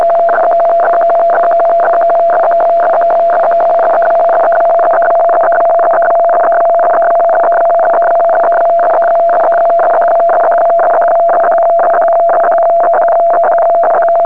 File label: wefax; rfi